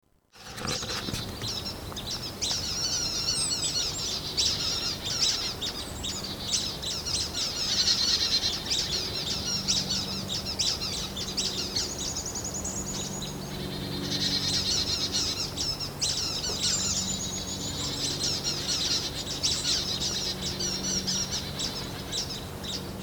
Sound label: Brown-headed Nuthatch Mob